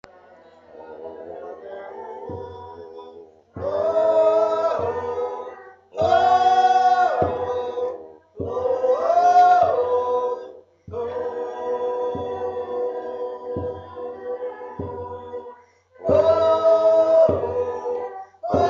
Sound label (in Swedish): Festsang 2 Tenor; Ronneby Folkteater